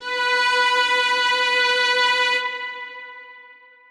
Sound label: Strings kiai